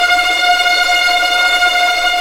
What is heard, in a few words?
STR TREM, Vlns Tremolo